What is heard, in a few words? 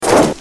get item